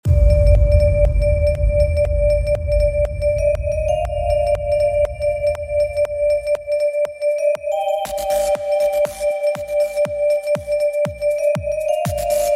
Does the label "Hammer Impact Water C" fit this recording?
No